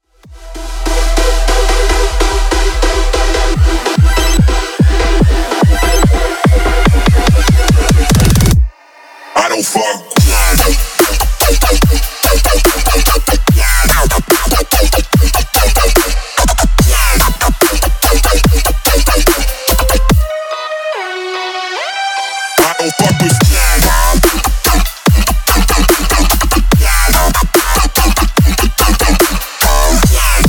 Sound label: Dubstep; Cymatics; Pressure; Dubstep Project File